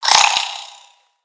Spider Die; Lego; creatures mechanical

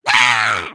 Air Fall; creatures organic; Lego; Strombie

